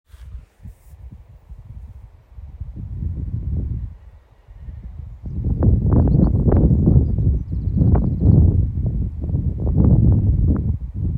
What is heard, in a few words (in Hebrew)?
רוח